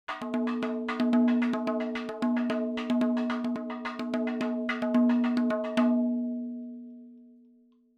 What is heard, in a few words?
110 7 8 MADALAM FILLS, percussion; Sample Banks; MADHALAM; MADALAM 7 8 FILES